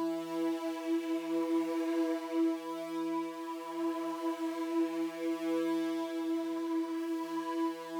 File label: Drone; stereo toolkit samples; Non Tempo Loops